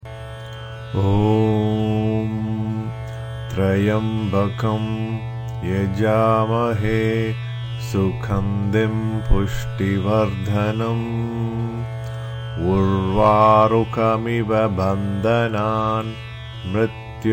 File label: maha mrityunjaya mantra